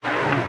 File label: arm retract, asteroid collector; Factorio